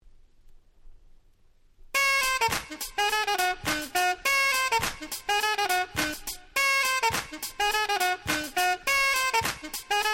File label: get funky sax